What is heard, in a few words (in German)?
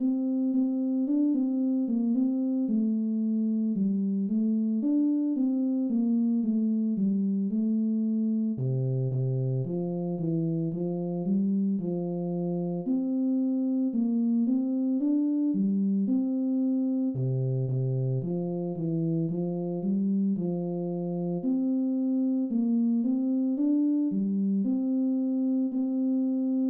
tenor